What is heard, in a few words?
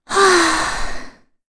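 Vox Sigh, Korean; Kirze; kingsraid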